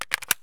ak74 mag in plastic; Escape from Tarkov

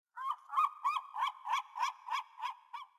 sting gulls; Rust

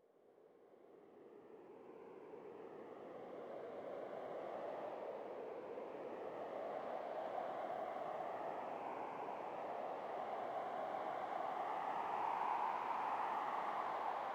sting wind gust; Rust

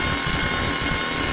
idle loop low npc

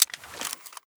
thermal deactivate; Escape from Tarkov

